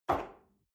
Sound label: espresso grab from table medium, restaurant cafe